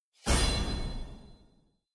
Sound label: defense; Skillzgaming; battle royale desktop